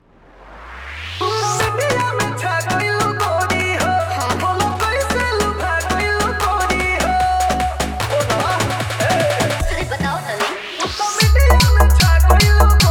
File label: Bhojpuri Dj Remix Songs Edm Trance Mix